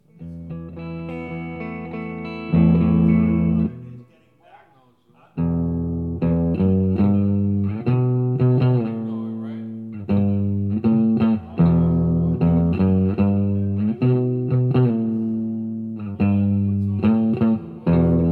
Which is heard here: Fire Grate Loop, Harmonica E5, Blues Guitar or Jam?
Jam